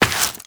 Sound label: Step Rock, Footsteps, Walk; Warframe; Tenno